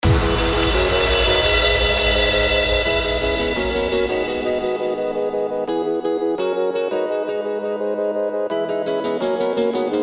hardcore techno; weeklybeats 2024

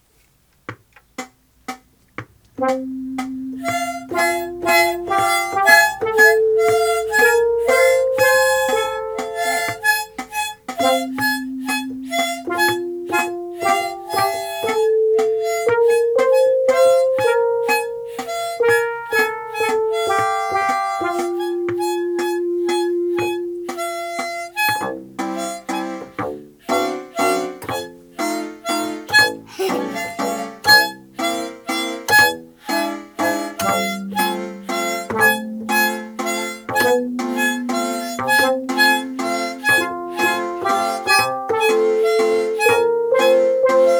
harmonica; harmonica love

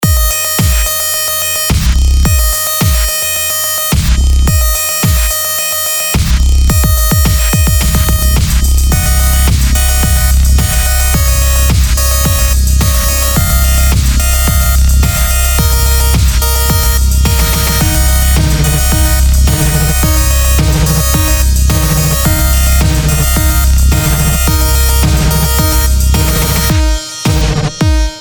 fart rock; weeklybeats-2020

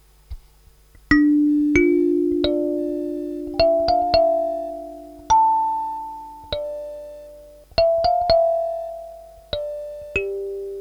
kalimba jam

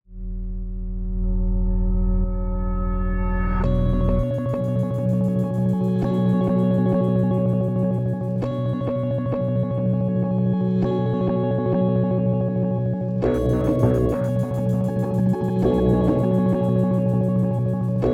glitch; ldn; subtracted; weeklybeats-2014

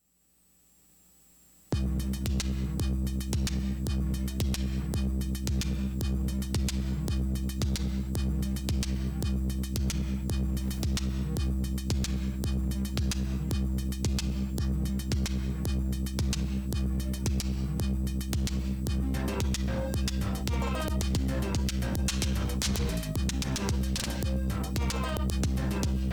90s bass

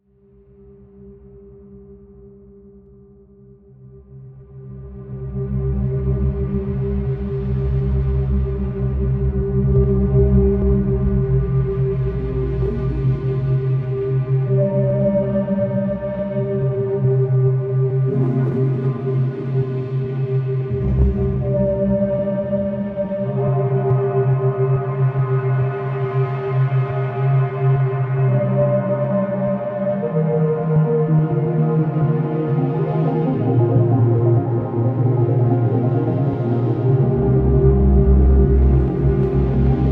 sine scanner

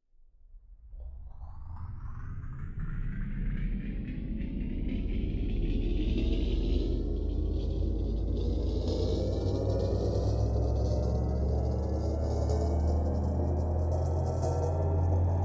sine waves for cymbals cat and coins; weeklybeats-2014

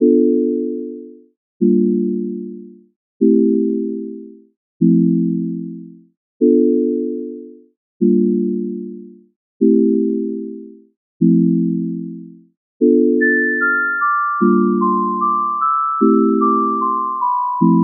spring sines

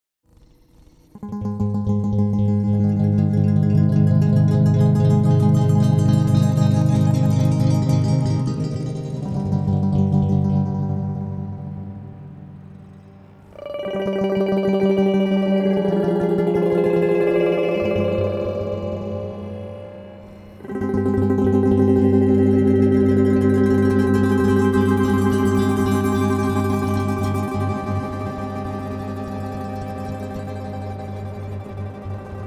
stereo guitar

